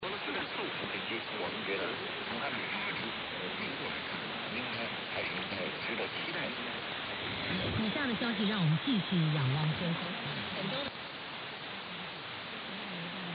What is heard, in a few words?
China Radio 13610khz